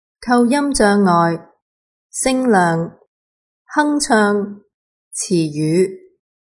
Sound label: humming word; aphasia